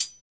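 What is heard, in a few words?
hi hat; Best Service Gigapack 2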